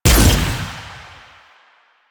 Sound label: Fire Shot; Duviri; Incarnon Adapters; Incarnon Dex Sybaris; Weapons